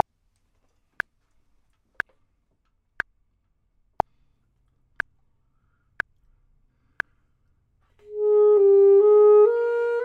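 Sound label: both, Saxophone and Clarinet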